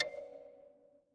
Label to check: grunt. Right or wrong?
Wrong